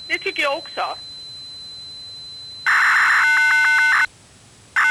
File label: nmt handover